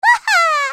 waha

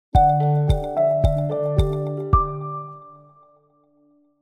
stinger

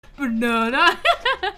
banana laugh

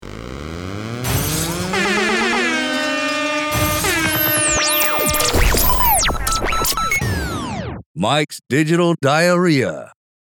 jingle

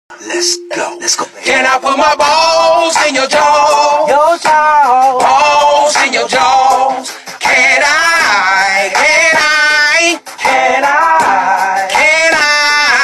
SONG HAHA

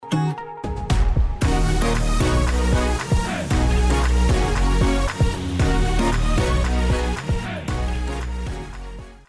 pluck it up

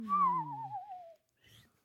slide whistle

